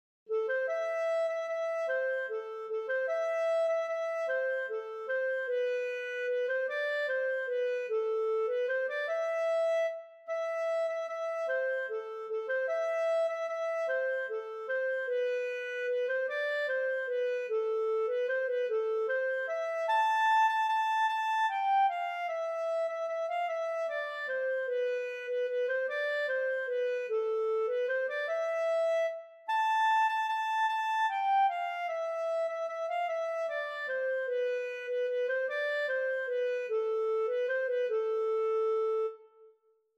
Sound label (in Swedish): vals